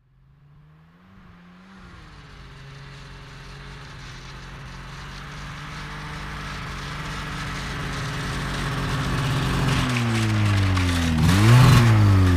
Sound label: Snowmobile; 550cc Engine In, Idle, Revs And Away.